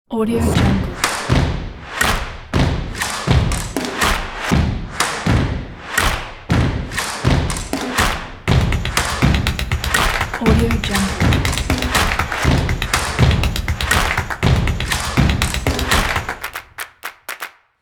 drum logo

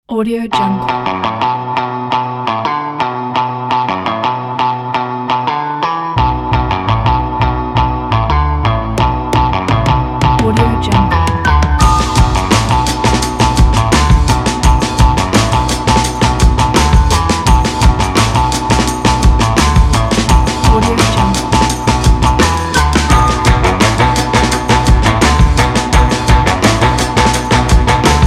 the groove funk vlog

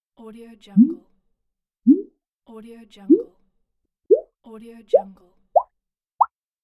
plop blob

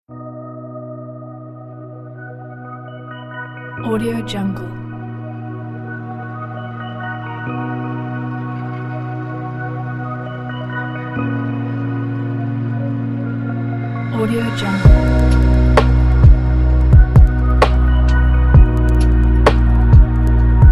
deep in the universe

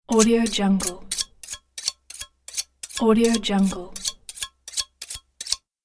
sharpening knife

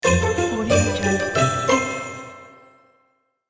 christmas sound

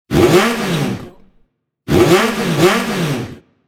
motorcycle engine revving

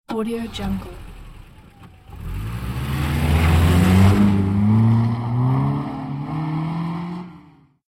sport car starts

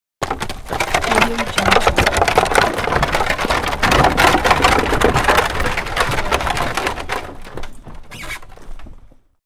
horse drawn carriage door starts and stops; hollywoodedge

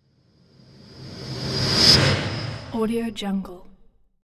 screech on a chalkboard; asylum

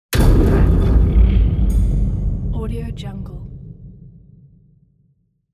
cinematic hit; volkovsound

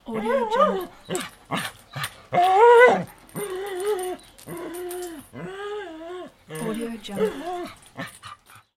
dog whining

